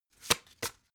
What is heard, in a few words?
playing cards shuffle single